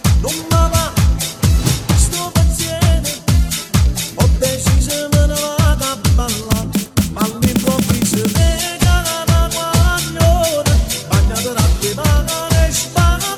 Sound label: dance disco remix